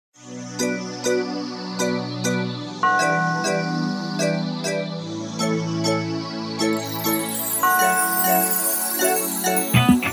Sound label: DANCE HALL, Dancehall Type Beat Instrumental